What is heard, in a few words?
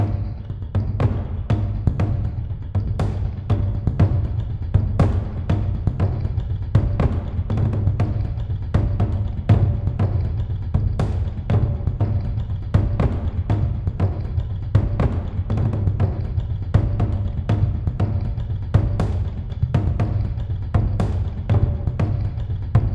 Musical Drums Big Complex; conan exiles gamerip 2017; darfari